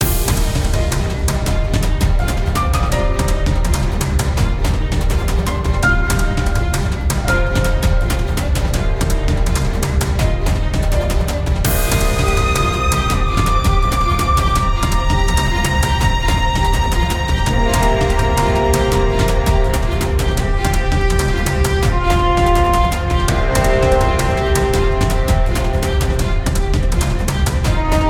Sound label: Lastboss loop; Tower of the Approval; Flan